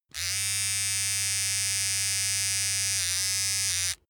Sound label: razor small